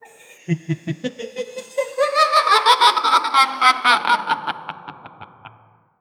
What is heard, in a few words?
clown laugh